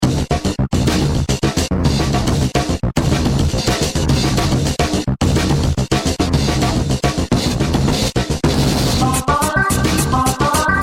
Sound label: instrumental